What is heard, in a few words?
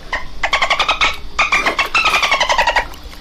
Dolphin Vocalization